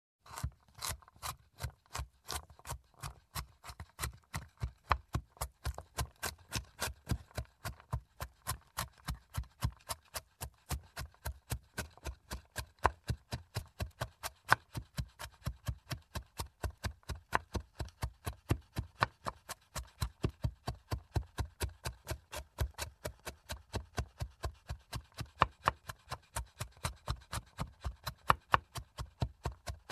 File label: Peeling and Cutting